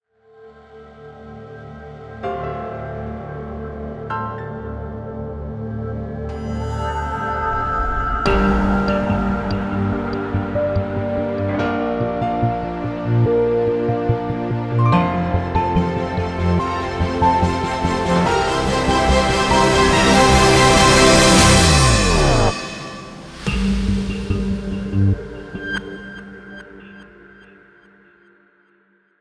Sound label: Gtr